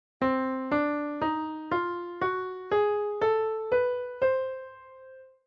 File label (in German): Tonleiter C Ganz Halb; Weitere Skalen; Tonarten